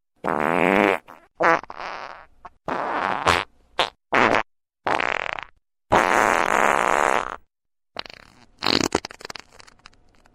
Nice Fart Noises

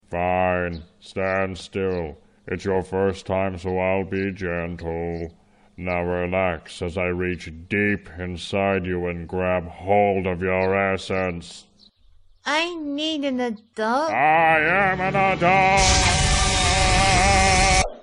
Power Up

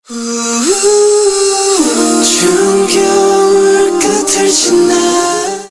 Acapella

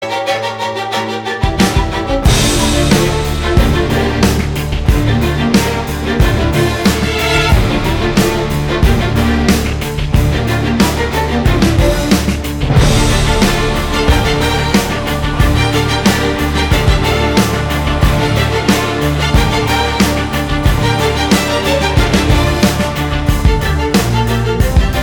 Tango

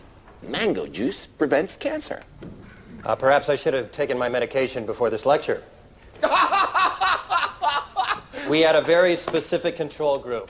Wahahaha; Dr House

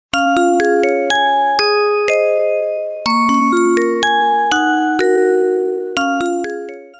Melody

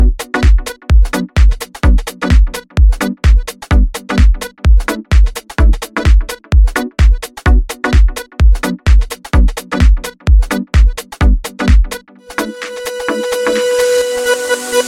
Sweet Melody

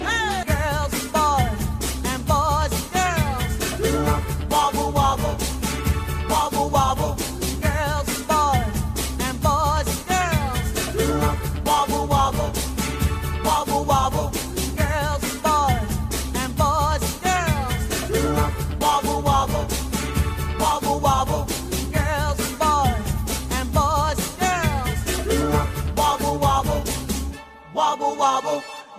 wobble wobble

